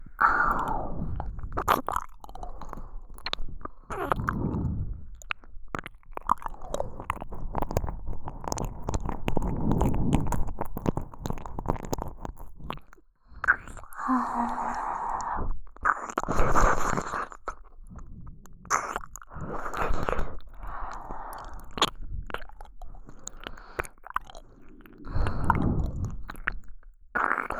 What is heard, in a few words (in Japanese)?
右耳舐めループ